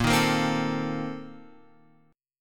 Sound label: Bb Major 7th Suspended 2nd Suspended 4th, Guitar Open F, chord; BbM7sus2sus4 strummed; JGuitar